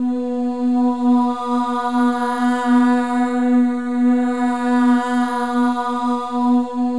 MOVING V4, Symphony of Voices; Spectrasonics

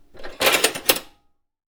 fooley

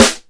Snare, Roland JX-305

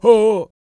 World Voices, CHOCTAW

